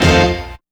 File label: Piano Stab; Sonic Foundry (Sony Creative Software); Electronic Point Blank; Drums & Percussion